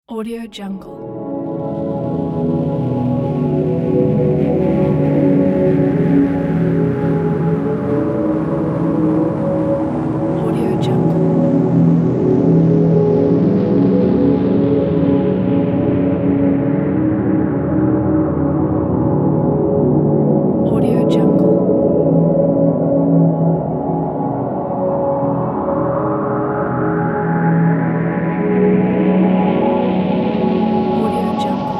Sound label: dreams ambience atmosphere